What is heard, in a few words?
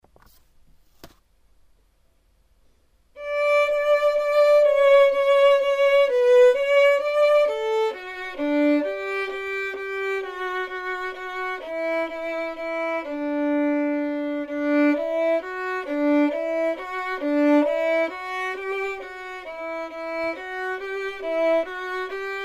vln perf; Building Technique with Beautiful Music, Book 1 Violin